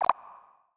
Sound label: tap